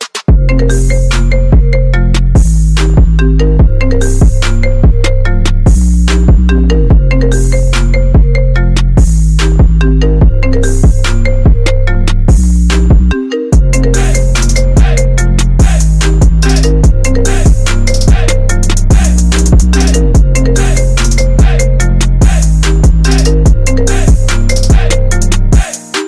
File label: Iphone Ringtone